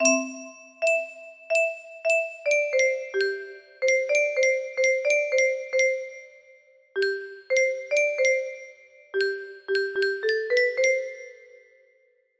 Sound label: music box